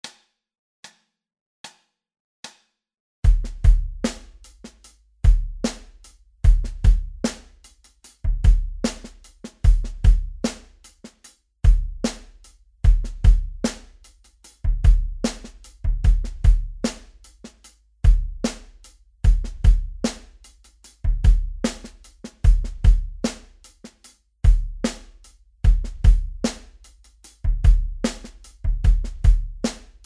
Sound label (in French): drum slap ex tempo 75